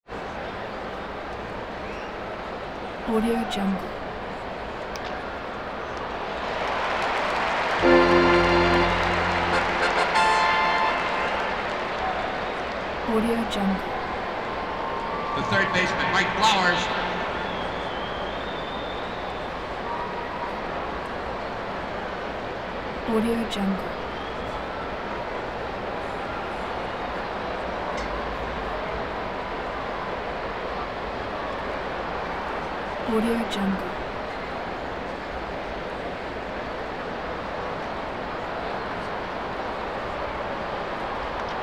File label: baseball crowd bat crack hit organ cue